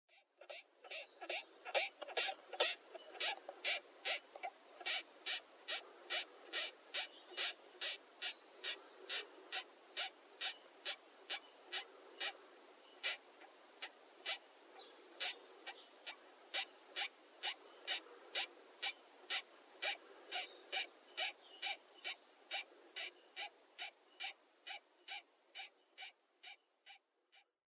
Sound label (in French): callidie